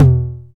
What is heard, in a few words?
TOM, Dance